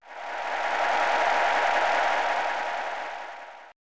Victory Cheer; Super Mario Excitebike

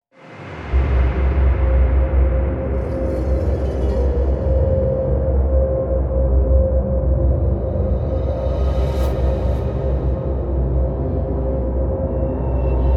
Pad

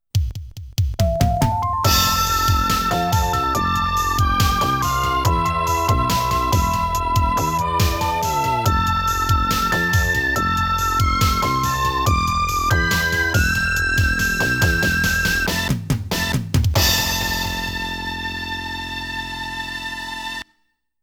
Ending - Sad; Aero Fighters 2; Sonic Wings 2; arcade

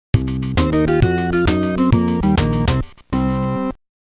Miss; Legend of Joe Musashi Shinobi Music Collection

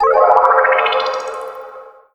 saucer Target

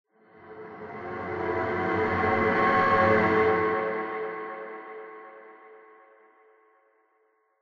Npc Combat Strings

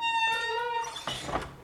dumpster

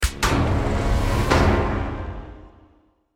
Stinger Action Medium; Naughty Bear Panic in Paradise; PS3; Xbox 360

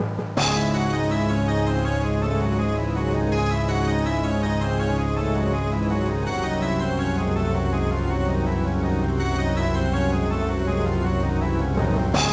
BGM; Monster Maker 4 Killer Dice; gba

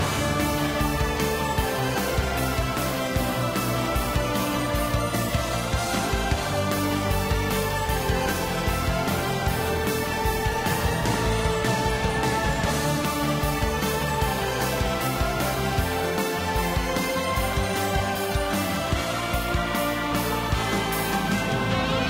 BGM Dungeon Hard

